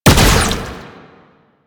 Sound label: Proto Infested Brachiolyst Projectile Fire; Warframe; Abilities